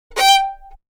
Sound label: Violin Aggressive